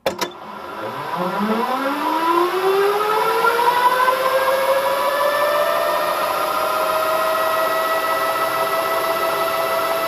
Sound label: Small Motor Under Str, electric motor